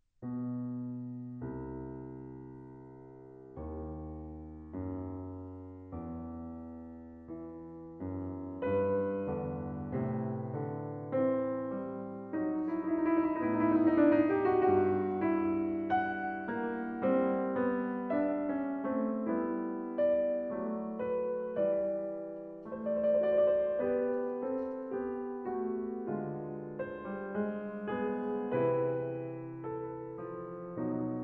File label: piano trio; dubois